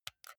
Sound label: console controller xbox rb press soft